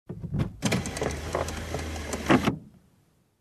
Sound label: Car window down